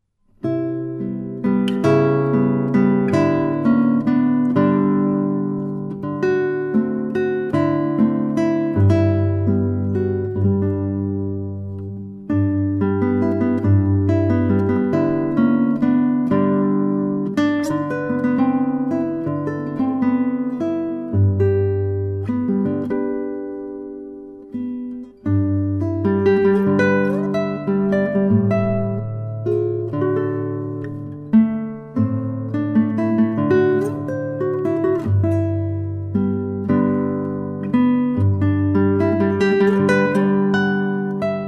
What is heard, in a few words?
Valzer Del Cerbiatto In G Major, Classical Guitar